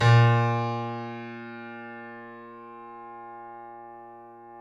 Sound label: KEY BB1, YC7 Piano mf; Roland - Rhythm Section